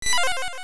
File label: Shapeshift; Veran shapeshifting between forms (also Digdogger miniatures reforming into Digdogger); Zelda Oracle